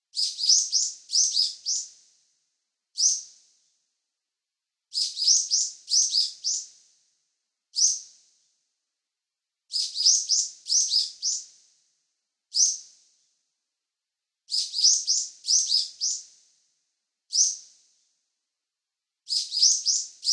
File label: bird; Project Yume 2kki; Yume Nikki